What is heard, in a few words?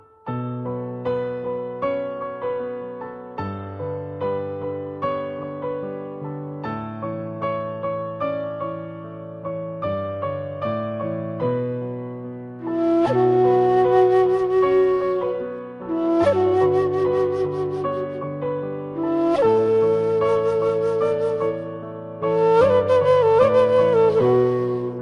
Soft Tune